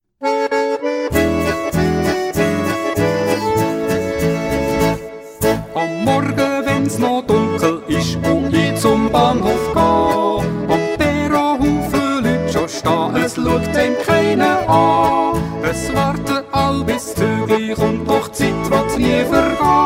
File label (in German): Duett